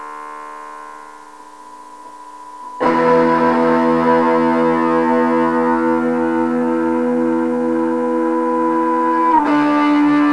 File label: metal